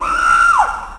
pain

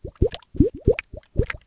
bubble; ambience

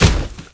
land; minibot

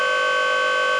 lift; rogue

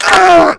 fall; gunner

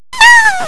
pain; speedy

